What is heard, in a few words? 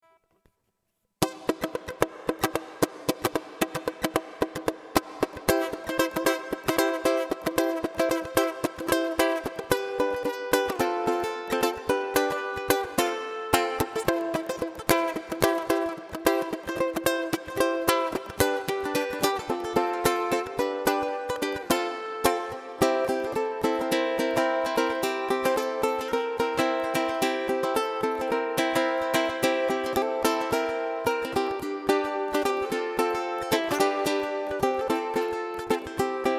uke